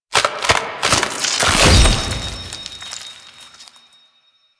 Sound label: Finisher Rip Gem; Amar; Warframe; The New War; Archon